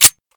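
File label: slide release, usp; svencoop; ins2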